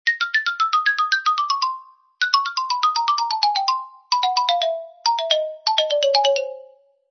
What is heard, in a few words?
XYLOPHONE